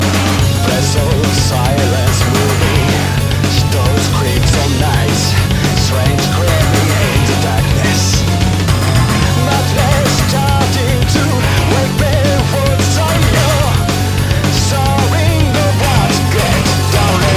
rock

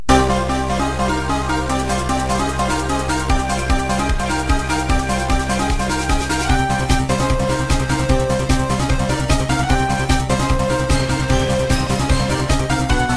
Orchestral

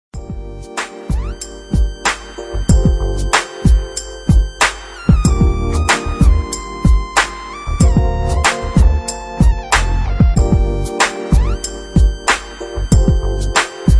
g-funk instrumental

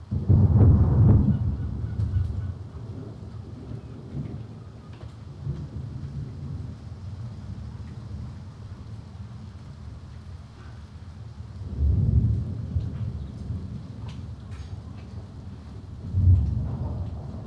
Rainstorm

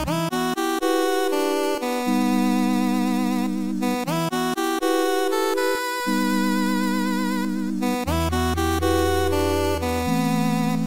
8 Bit

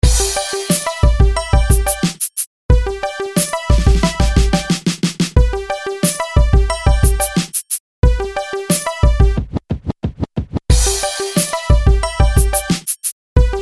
Marimba Old School Rap

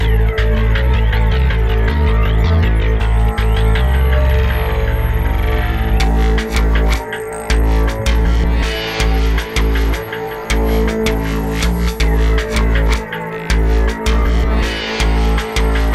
Film Music

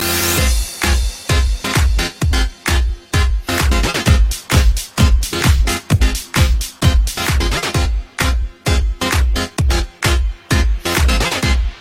greek club, ringtones, electronic

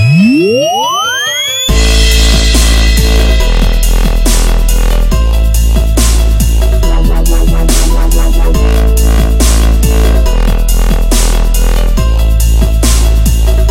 Dj Remix Bass

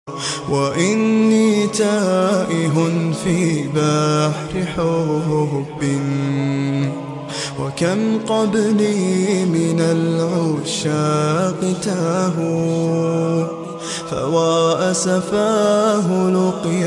arabic lost love, ringtones

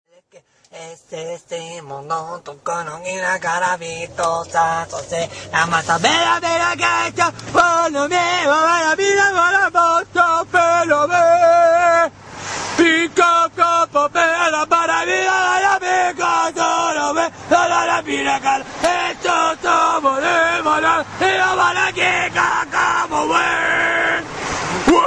singing out his car window at a stoplight

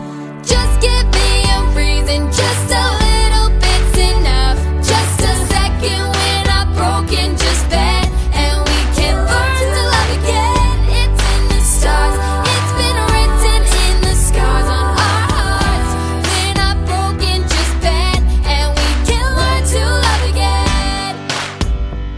dubstep

